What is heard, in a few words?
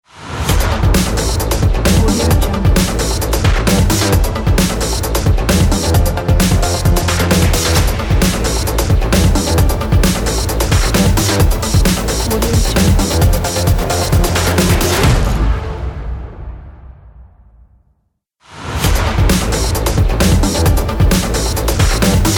energetic electronic logo